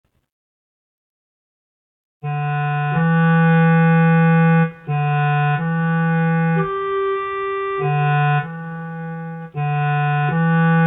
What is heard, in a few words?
clarinet